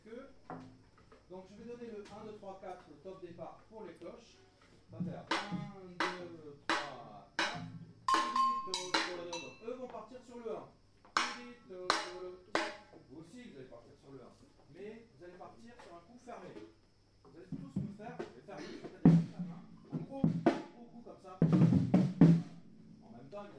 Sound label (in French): afoxe surdo, batucada